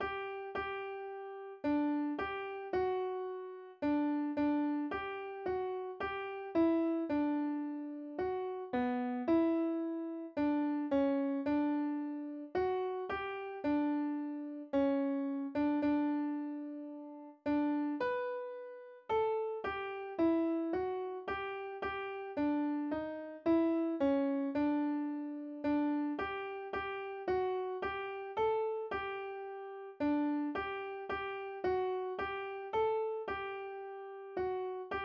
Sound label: bass; 神のみ子は; アンサンブル・レオーネの音取り音源